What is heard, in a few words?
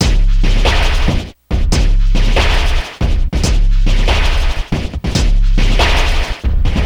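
Loop, beats; Sonic Foundry (Sony Creative Software); Esoterik Beatz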